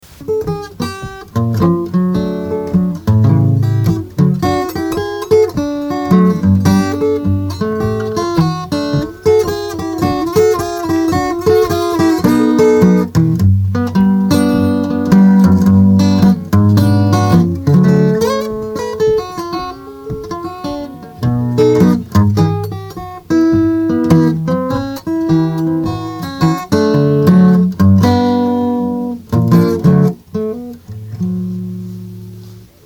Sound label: Guitar Solo